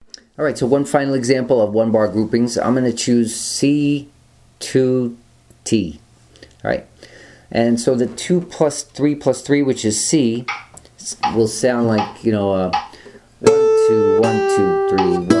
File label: rhythm generator 1 bar example